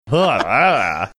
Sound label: disgusted sound